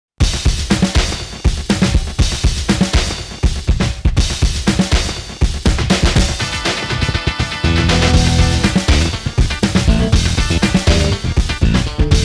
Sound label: Stormy Scream, Stormy Saxaphone; the king of fighters 2000; Iori Yagami